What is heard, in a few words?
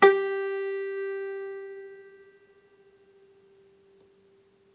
string 1 fret 1